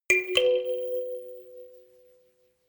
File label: notification kalimba rattle fourth up